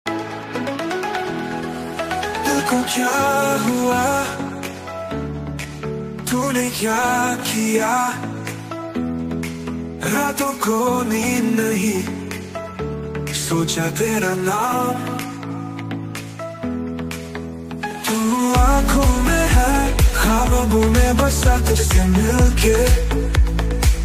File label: love ringtone hindi ringtone